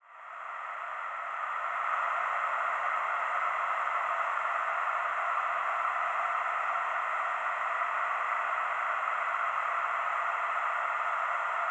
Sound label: Kyma Ambiences; USO